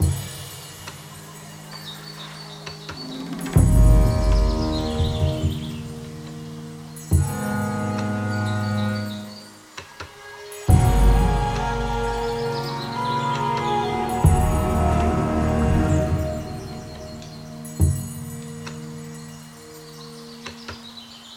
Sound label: free Spin Outro; riches of midgard